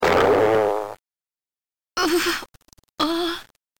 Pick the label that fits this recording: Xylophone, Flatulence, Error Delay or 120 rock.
Flatulence